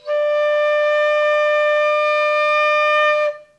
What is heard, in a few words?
D5 fully vented, flute; classical